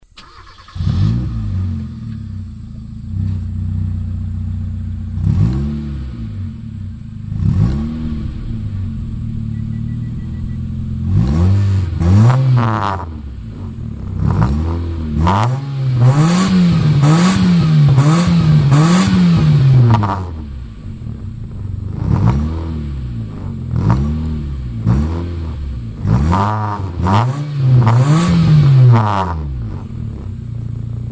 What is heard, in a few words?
ec5s original, muffler; car